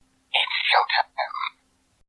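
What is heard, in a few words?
Wii Remote Sound; Snake; Wiimote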